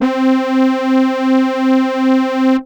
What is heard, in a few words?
Techno leads, hits and riffs